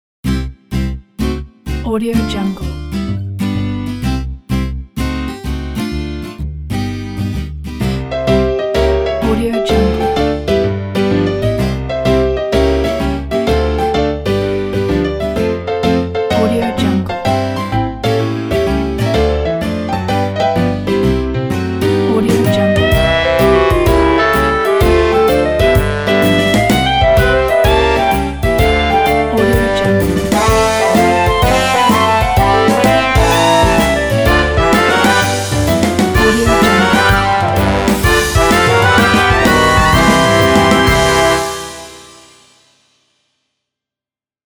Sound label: piano bar